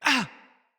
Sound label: Surprised, Barks; Battle; Envoy